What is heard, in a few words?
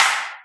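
snare, Boss DR-202; Drum Machines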